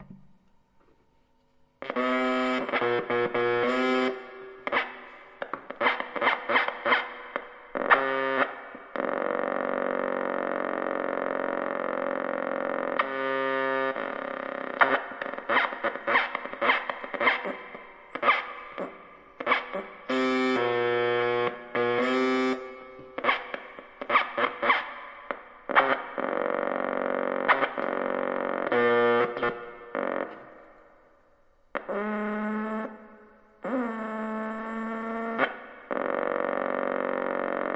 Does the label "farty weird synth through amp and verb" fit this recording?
Yes